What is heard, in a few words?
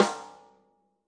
natural sd rim on